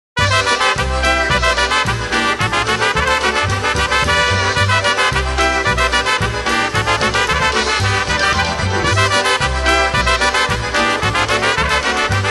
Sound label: Polka